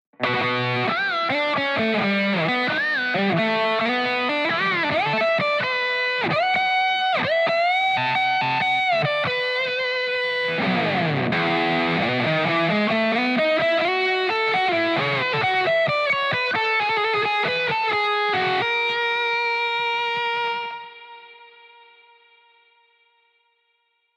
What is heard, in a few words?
booster on